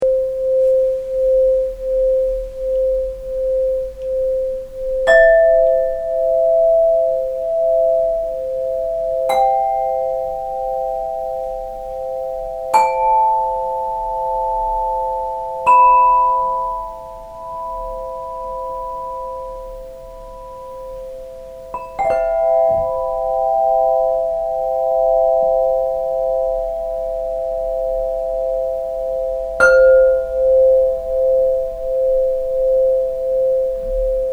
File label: Pentatonia Solo